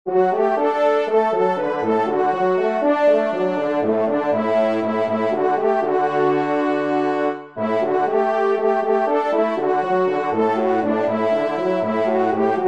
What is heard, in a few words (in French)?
TRP; A Pierrelaye; 3e Trompe